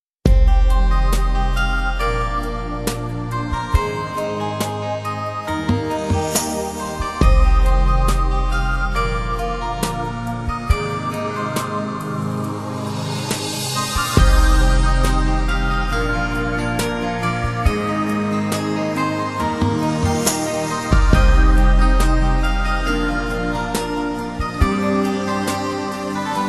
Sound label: chinese tranquility